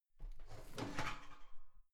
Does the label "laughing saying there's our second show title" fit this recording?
No